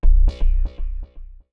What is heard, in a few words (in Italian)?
suoneria botton; beep